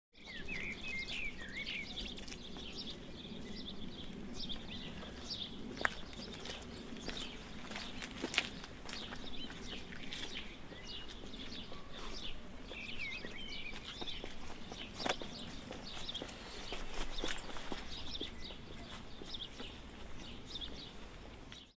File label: bike footsteps, whitstable